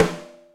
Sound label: Rimshot Undamped; drum hits; snare; Ableton; Factory Packs; Drumdrops Recording Hybrid Kit